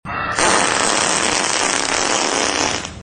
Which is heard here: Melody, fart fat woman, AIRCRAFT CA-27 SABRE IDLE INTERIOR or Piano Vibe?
fart fat woman